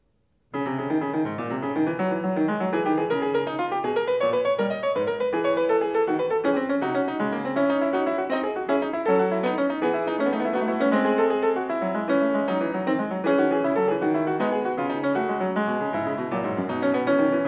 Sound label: Fugue No. 4 in C-sharp minor, Bach, Well-Tempered Clavier; BWV873